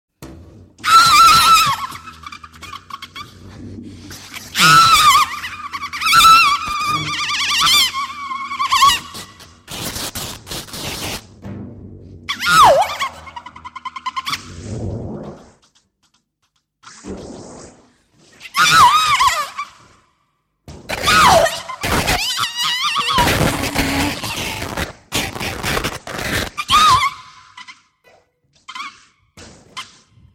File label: splash noid